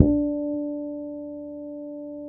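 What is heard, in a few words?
D 4, fretless harmonics, bass; Spectrasonics; Bass Legends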